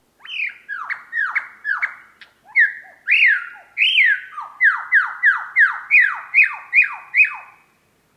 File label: long swamp road